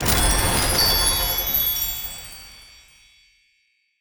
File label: coins